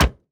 environment hammer hit

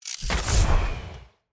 Box Rocket Launch; TANK; 3Souls

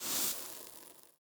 room ash ready; Shelter

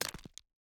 environment pickaxe hit stone

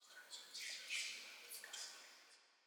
ambience room shadow room working layer

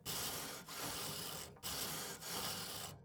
ambience room butchery working layer

